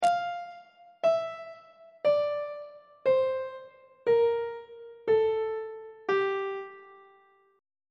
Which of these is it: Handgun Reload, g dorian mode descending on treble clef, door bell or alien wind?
g dorian mode descending on treble clef